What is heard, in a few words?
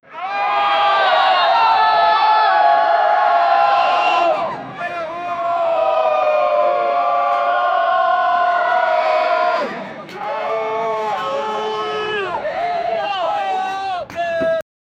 paparazzi and fans are ye